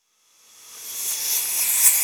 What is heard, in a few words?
Shimmer, Hiss, Retracte, Weave; Electa Quinney